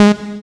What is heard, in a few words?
SYN POLY, JD 800; Sound & Vision - Gigapack I CD 2; JD 800/990